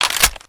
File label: ppsh mag in; weapons